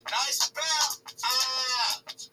aaaaaaaaaaah

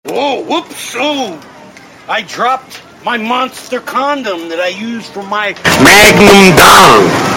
boost

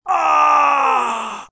death; druid

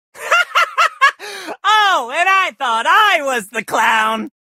rhehshahahahah

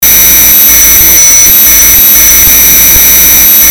loud loud ringing

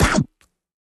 duck bite; enemy

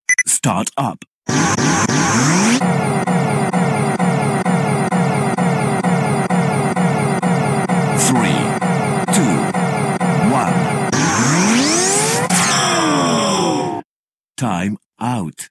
accel startup; kamenrider faiz

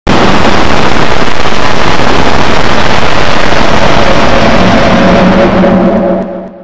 jumpscare; owakcx sprunki

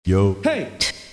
yo hey, casio sampling; t casio sampling ctk

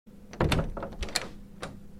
door close; mskowler

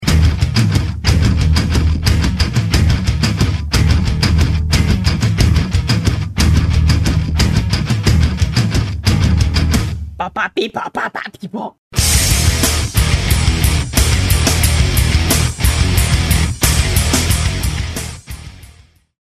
metal

